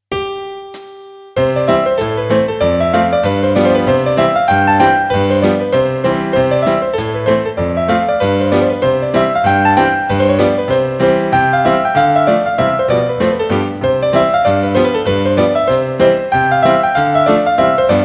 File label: Hornpipe